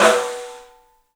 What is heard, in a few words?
STEEL, Drums, ROOM SINGLE; 3D Sound